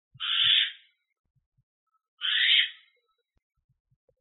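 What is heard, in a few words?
Barn Owl short scream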